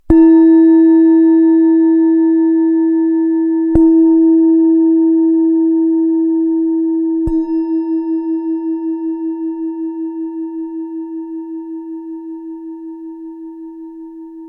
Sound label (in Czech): tibetska misa; e4; 12cm